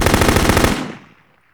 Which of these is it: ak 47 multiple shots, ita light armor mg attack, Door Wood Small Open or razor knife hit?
ak 47 multiple shots